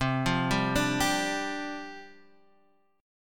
C Suspended 2nd Suspended 4th, down, Csus2sus4 chord; Csus2sus4 arpeggiated